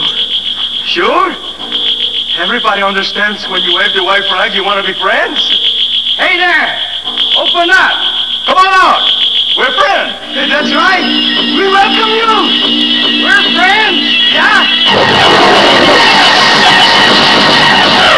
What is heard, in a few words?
start windows; War of the worlds; Desktop Themes